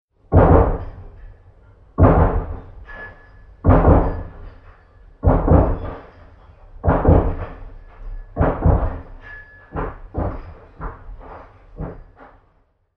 Domestic Buliding Work Next Door Banging Hammering Heavy Debris Falling